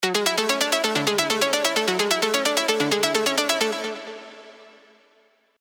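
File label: arp, tropical pops; Axinite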